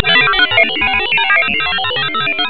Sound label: Thinking Mac; TinyTown